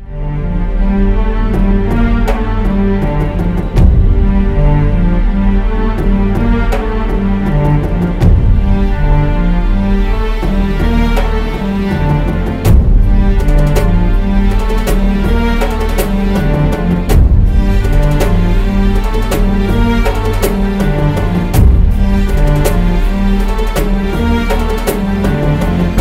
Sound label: Telugu Bgm